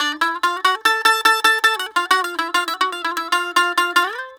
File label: BAGL D, BAGLAM, Mediteranean Traditions